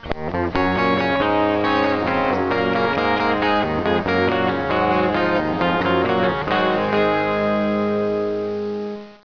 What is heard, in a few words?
rock